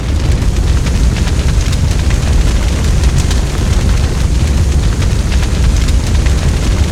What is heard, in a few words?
weapon fire idle